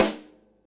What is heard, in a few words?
subtle reverb steel snare drum g sharp key, Royality free snare drum sample tuned to the G#